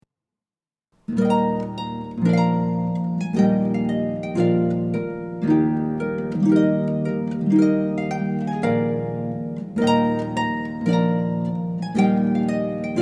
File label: TRUMPET TUNE, harp